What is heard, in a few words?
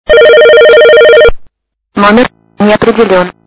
Ring